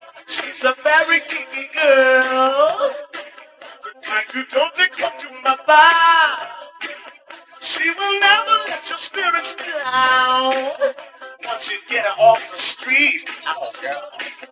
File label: voice